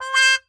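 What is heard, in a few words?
trumpet C hi mute harmon wah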